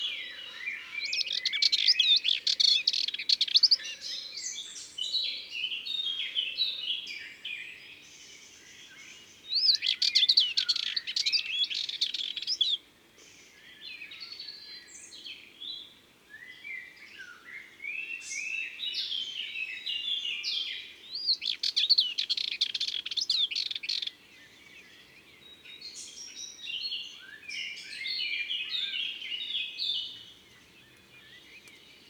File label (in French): Fauvette Melanocephale Concerts Naturels De l Ardeche